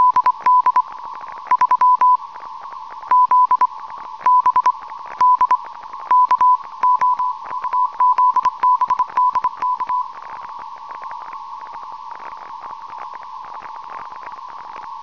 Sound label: cw 5.167,5 kHz